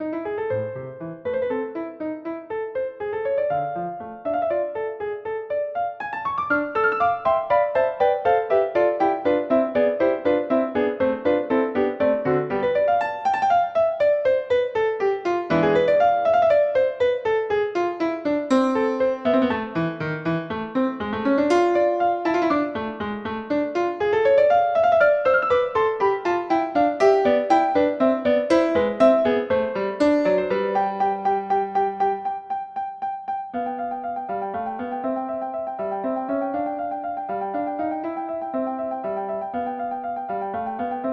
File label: Moderato grazioso for piano synth